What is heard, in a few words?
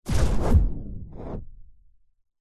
science fiction weapon incoming bullets hit impact whoosh; zapsplat